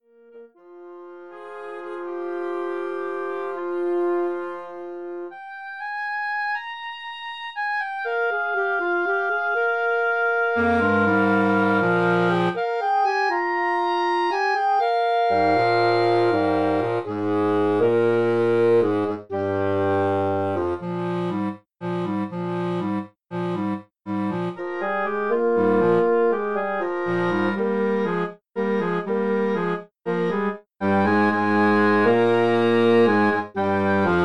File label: midi; close reeding